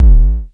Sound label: BASS HIT, Dual Percussion Synthesiser; Klone